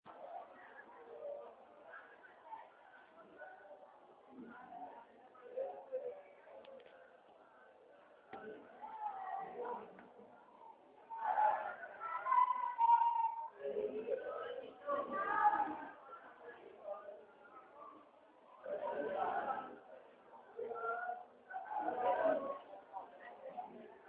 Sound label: classroom noise w teacher